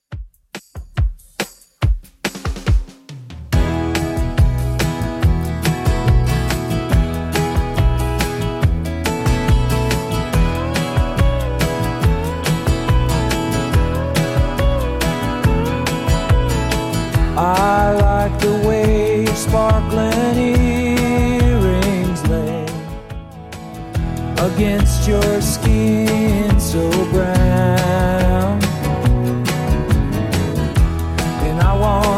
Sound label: 70s Redrum 141bpm